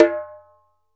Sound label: ASHIKO, African Drum; E-MU Producer Series Vol. 7 – Old World Instruments